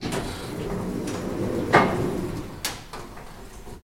elevator door open; soundscrate

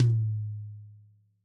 tom hi; soundscrate

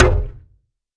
bonk; SoundsCrate

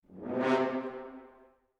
rip

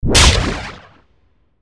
Sci Fi Laser; SoundsCrate

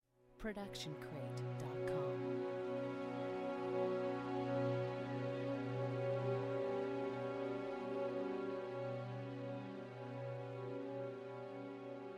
sad long strings

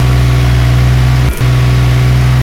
engine loop; soundscrate

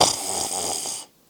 snoring human; soundscrate

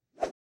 fighting air movement; soundscrate

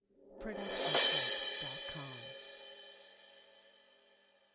crash cymbal 16 inch swell mallets short; soundscrate

